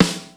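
snare power, Drum Machines, Yamaha Motif ES-Series; 300 Drum Machines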